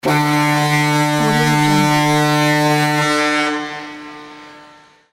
ship horn

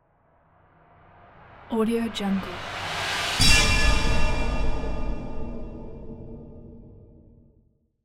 horror riser impact

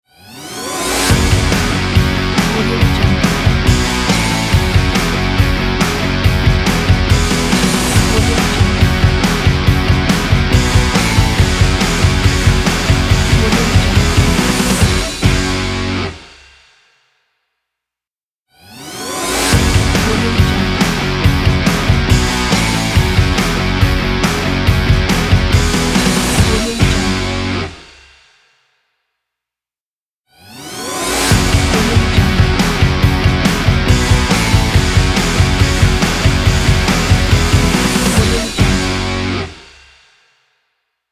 energetic rock sport intro logo; wolf-music

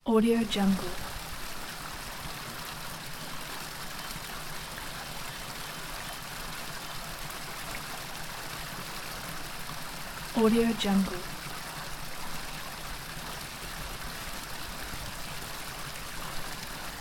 little waterfall

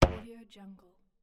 scifi small energy weapon shot

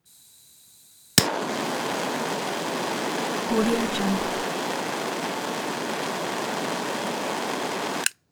small gas blowtorch burn

